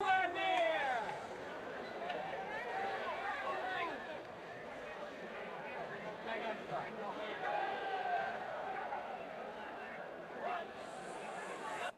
outdoor concert crowd idling with scattered clapping